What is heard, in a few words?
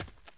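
run right, dirt foot; The Elder Scrolls III Morrowind GOTY; XBOX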